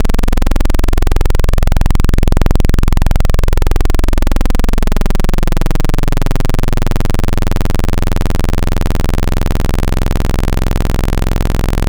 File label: PWM Saw; Synthetic's Serum Collection; ESW Core Wavetables; Echo Sound Works Core Tables